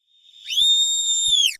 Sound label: Evil Fairy Screech